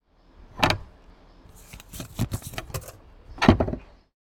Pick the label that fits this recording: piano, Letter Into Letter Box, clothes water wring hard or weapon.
Letter Into Letter Box